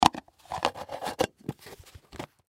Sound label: Container Replace Lid; Lids; Objects; Blastwave; Foley